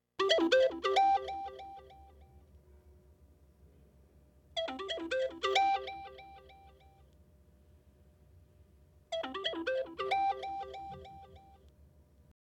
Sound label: Synth, Olofonia; L'olofonia è una tecnica di registrazione e riproduzione audio eseguita tramite uno specia